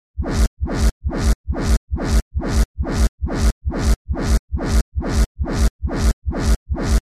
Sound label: Reverse Bass Loop F (138 BPM)